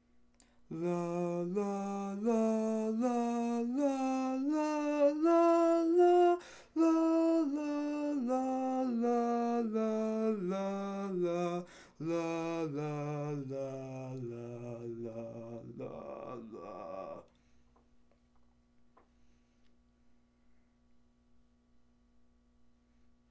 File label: Baritone; allstate2024 jrhigh; Audition